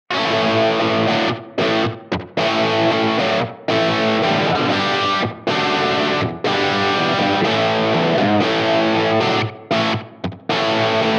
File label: Plexi Ranger; Blackstar Polar 2